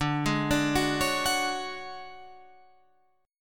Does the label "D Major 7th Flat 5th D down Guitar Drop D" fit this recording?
Yes